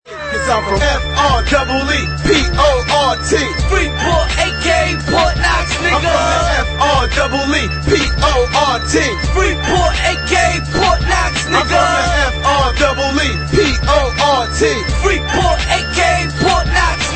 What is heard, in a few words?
anthem